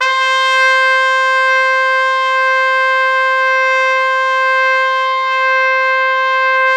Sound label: C4, Pop Brass; Best Service ProSamples vol.19; AKAI